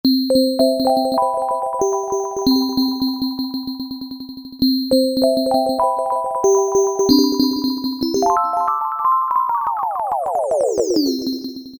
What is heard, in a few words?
Bound Ball, Korg MS2000; Factory Patches